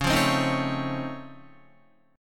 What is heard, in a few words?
Db Augmented Major 9th Db down Guitar; strummed; 4E,4A,5D,5G,5C,6F